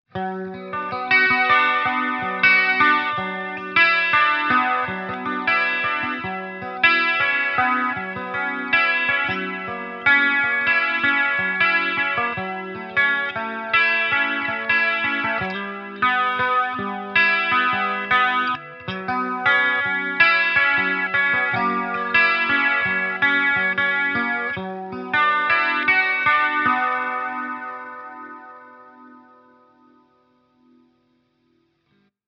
Univibe; Harley Benton MiniStomp Hot Mod

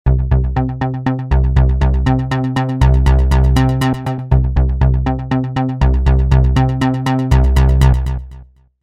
arpeggio

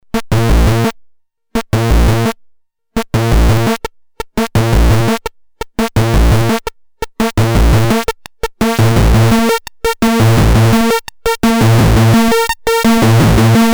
Sync Sub; Io Instruments Themisto

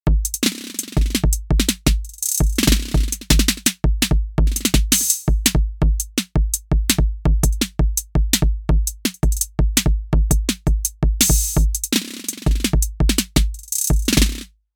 TR-606

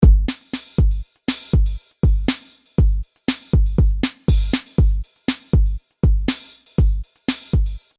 Drumloop new filter bd

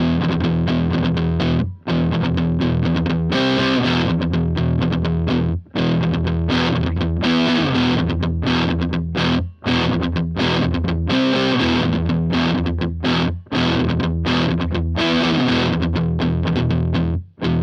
Hagstrom Ultra Max Neck

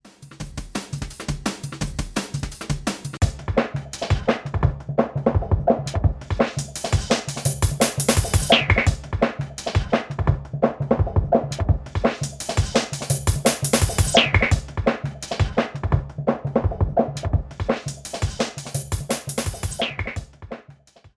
Yamaha Genos DnB Style Live Control

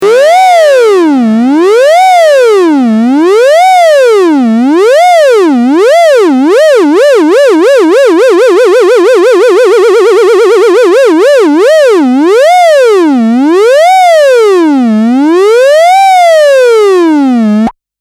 takeoff

